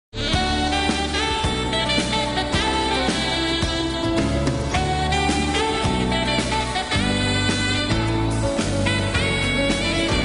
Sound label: Sax Intro